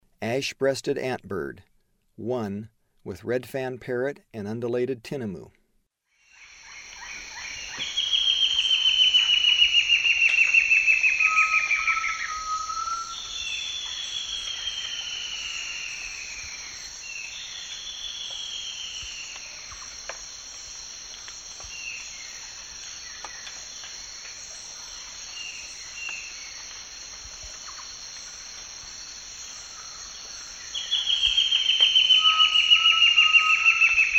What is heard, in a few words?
Ash-breasted Antbird; S. America; Eastern Lowlands